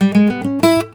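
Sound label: baritone lick E, Blues Guitar; ILIO; Fretworks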